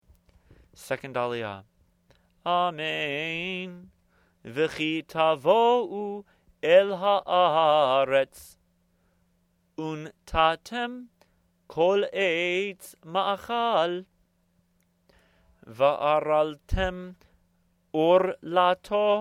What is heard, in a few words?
Torah Chanted; Kedoshim